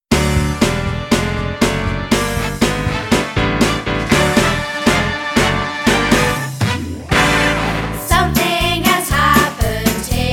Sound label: Vocal